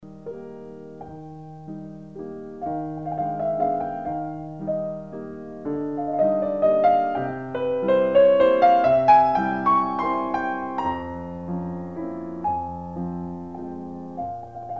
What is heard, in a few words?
nocturne in e flat major; schubert